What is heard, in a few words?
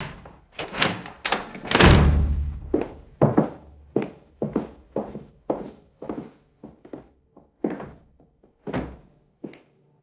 heavy door opening and slamming with footsteps